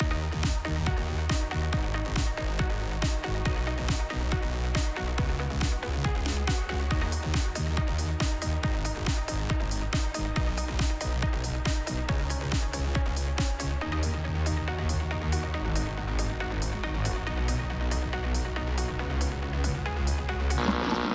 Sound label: music boombox electroheel radio